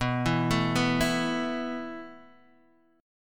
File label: A Suspended 2nd B